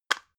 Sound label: drug package drop soft; arzt